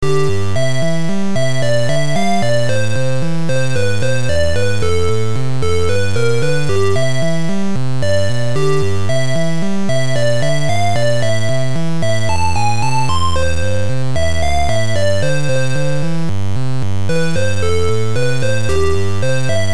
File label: Staff Roll; Bomberman GB